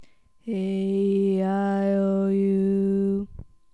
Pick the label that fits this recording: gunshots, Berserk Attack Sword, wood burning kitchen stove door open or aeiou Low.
aeiou Low